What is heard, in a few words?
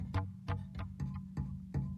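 Calm Far, music; From Dust; ps3; xbox 360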